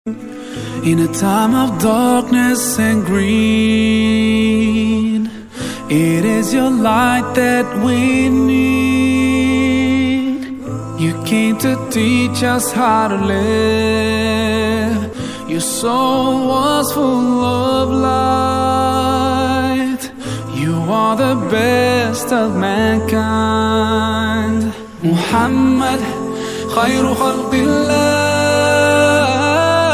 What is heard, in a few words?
eng islamic ringtone